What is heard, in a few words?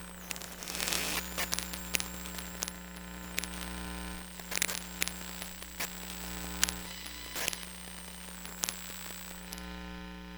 Computer Tower, Electromagnetic Sounds; Micro Pack; NazdyNate